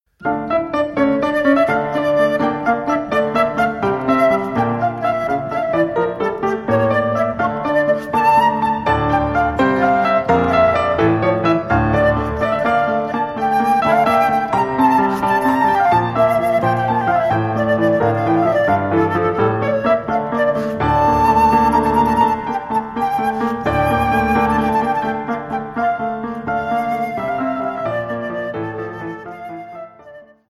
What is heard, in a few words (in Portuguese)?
Melodia; Gurdjieff; de Hartmann